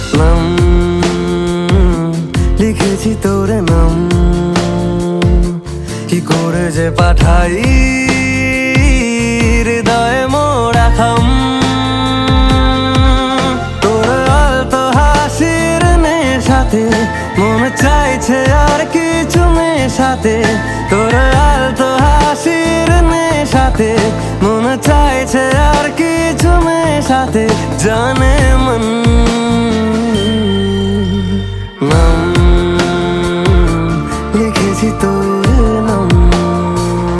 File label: Romantic Bengali Song